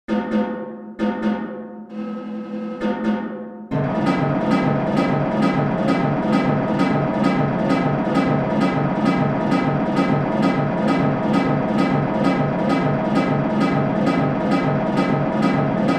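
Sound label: School Songs tenor line, drum music; marching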